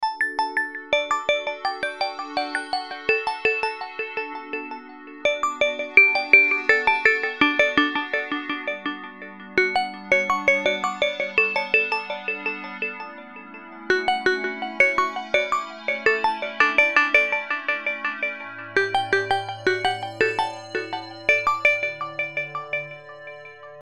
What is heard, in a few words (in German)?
glockenklang